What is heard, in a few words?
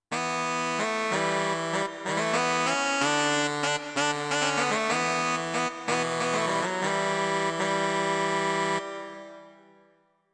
Pungi